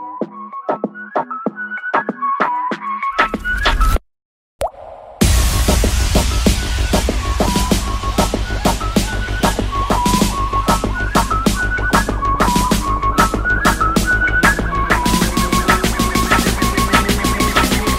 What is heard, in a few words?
Ringtone Remix